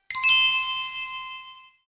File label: result win; inlogic-checkerslegend